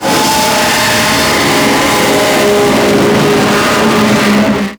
Sentient Vessel Movement Limb, Enemies; Warframe; Tau

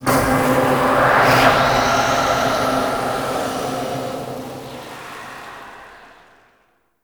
Sand Vortex Start; Ambience; Gameplay; Warframe; Entrati

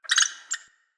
Squirrel Trapped Call; Encounters; Animal Rescue

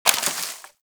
Bullet Impact Debris Wood; Warframe; Weapons; Bullet Impacts

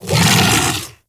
Kubrow Armored Vocal Alerted; Warframe

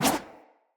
Sword Whoosh; Weapons; Swords; Garren Sword

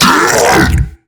Hand Bomber Pain; Warframe; MiTW; Battle Barks

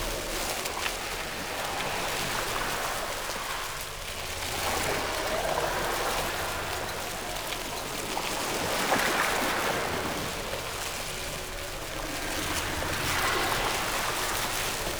Fist Claw Spike Loop; Weapons; Warframe; Tenno; Incarnon

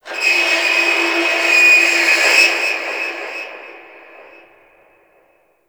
High Metal Screech; Warframe; Tau; Ambience

